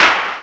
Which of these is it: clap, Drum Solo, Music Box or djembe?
clap